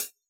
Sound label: hi-hat closed, Yamaha DJX Rock Kit